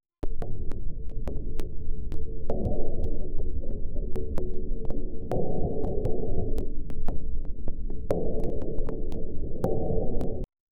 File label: boop sound in emptiness